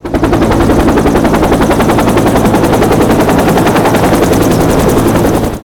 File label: Helicopter